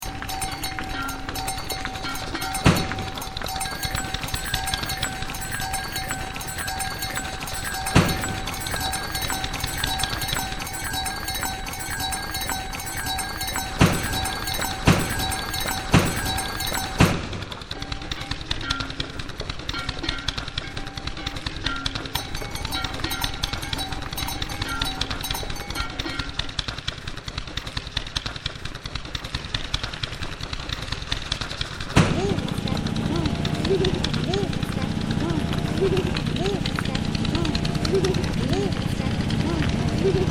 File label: Bell Bang